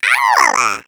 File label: egg bot tractor beam; player; Portal 2